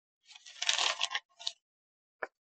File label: sound of paper crumpling; winchester; century ghost